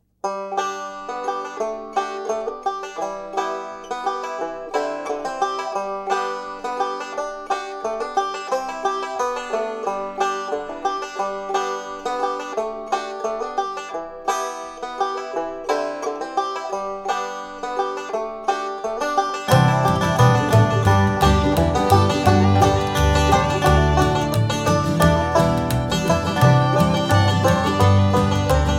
string band; Country

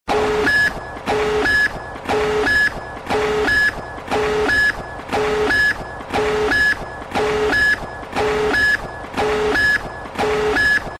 nuke countdown; MW2 Nuke Countdown

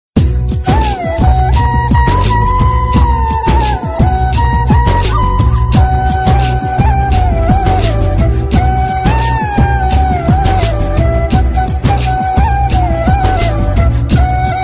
tamil melody; Bollywood

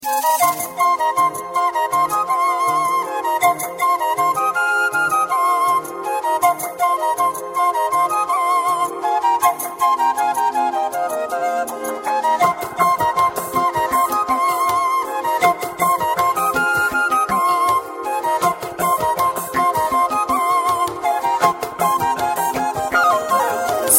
Kannada flute; Indian

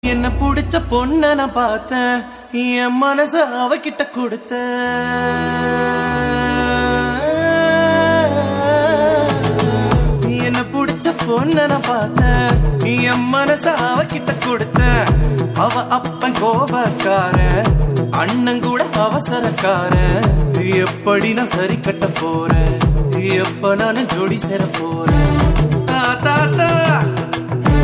kovil music; Indian

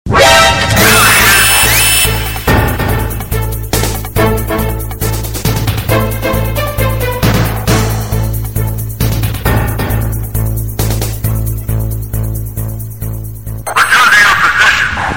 alert; Metal Gear

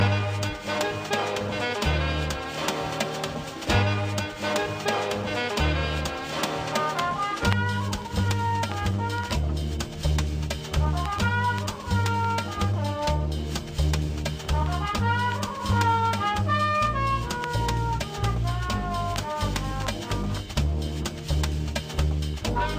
cool funky jazz

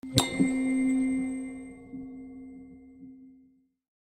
broken music box; World Cup

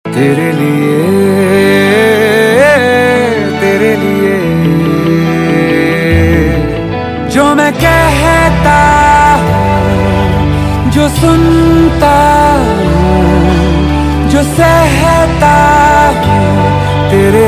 ringtone; Bollywood

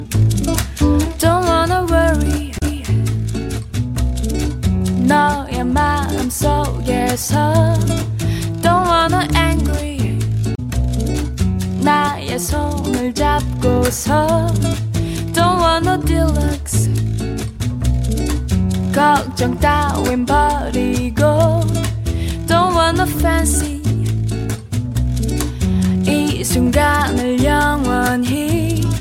cha cha ringtone; Jazz

